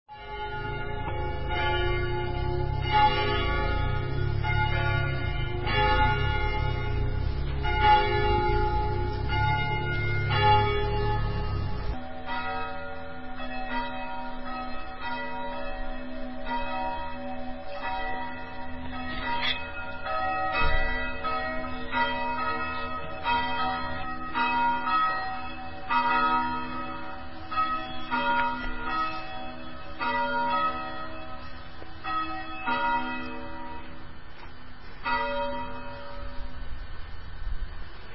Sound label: Bells Of Hambleden